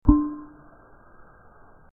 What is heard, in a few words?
tiny tuning fork